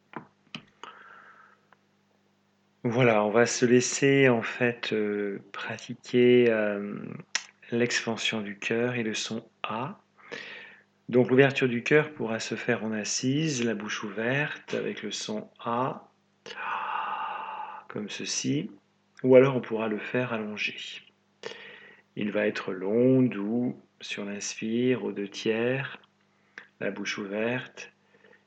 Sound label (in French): MEDITATION expansion du coeur souffle HA